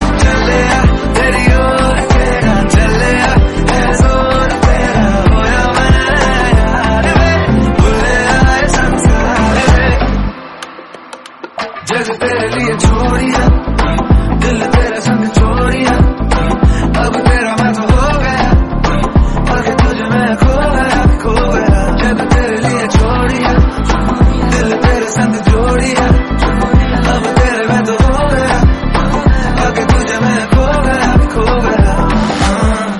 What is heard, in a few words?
Hindi Song Ringtone